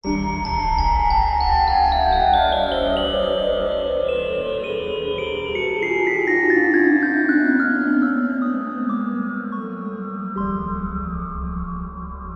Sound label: horror ghostly ominous haunted musical riff chime scary